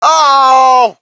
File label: crowd, shout; Mike Tyson Heavyweight Boxing